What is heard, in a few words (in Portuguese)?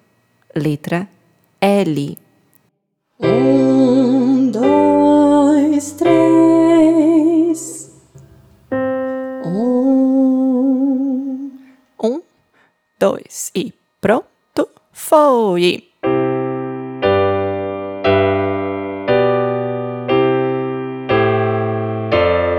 Solfejo Melodia Solfejo Melodico; Letra L